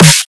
Whiplash Snare

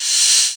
Fx Vox; Equipped Music; Loungin House

